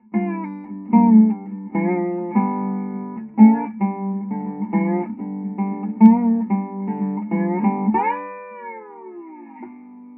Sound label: dobro filtered through mic response